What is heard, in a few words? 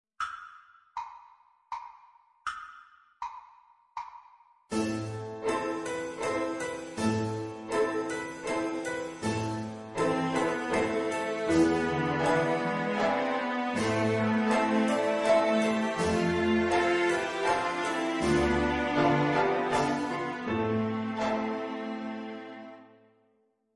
accompaniment, violin; heypr for violin bk 1 bronze